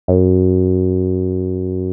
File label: TB 303